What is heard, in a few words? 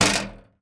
war ui source circle sheet magnet board fling rattling magnet